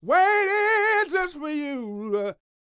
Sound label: Vocal Gospel; Vocal Planet Vol1 Gospel